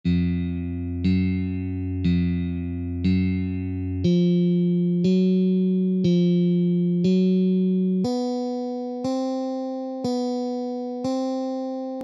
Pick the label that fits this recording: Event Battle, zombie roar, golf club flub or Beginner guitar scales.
Beginner guitar scales